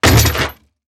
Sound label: Impact Gate Wooden Gate Hit With Chain Thud Slide